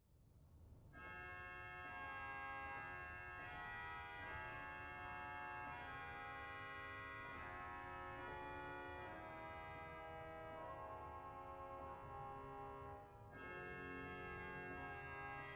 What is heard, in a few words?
Aeoline 8, organ; dortmund; Sauer